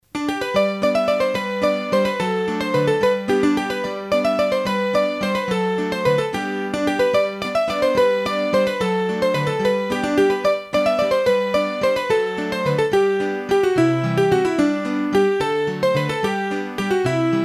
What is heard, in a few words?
Mazurka, hurdy gurdy; Massif Central; Mazurkas